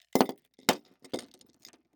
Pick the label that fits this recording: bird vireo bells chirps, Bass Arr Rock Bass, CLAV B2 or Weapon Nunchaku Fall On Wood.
Weapon Nunchaku Fall On Wood